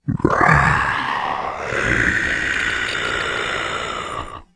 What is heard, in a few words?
monster death